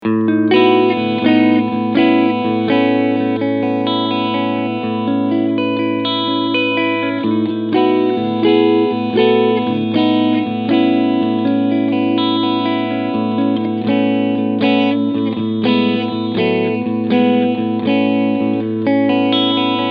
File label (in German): Ibanez AZS2200F beide PUs Clean Picking; Alternate 2